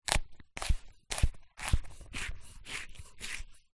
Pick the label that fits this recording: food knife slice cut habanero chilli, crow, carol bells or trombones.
food knife slice cut habanero chilli